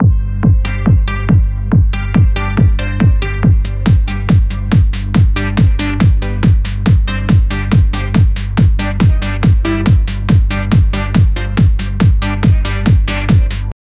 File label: dance song, background music